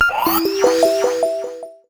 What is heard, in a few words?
SFX - Challenge Success; uno